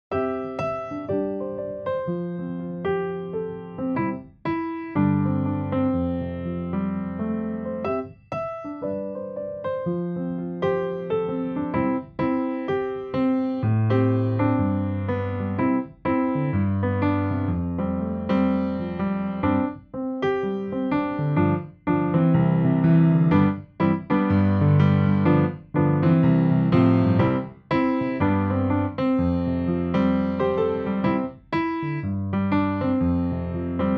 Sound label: Backing, playbacks; primeiros passos